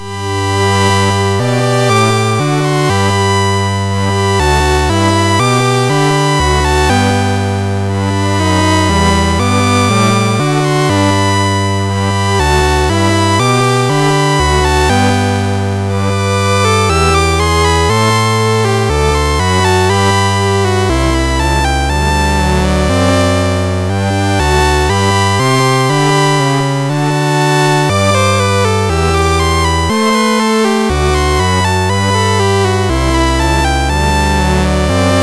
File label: Christmas Music, MOS6581R4